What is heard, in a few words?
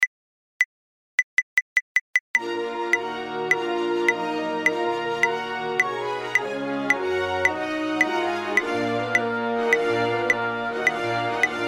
double bass, 310 BPM with click; BEETHOVEN no5; Bars 27-100; digitalorchestra; symphony no 5 movement 3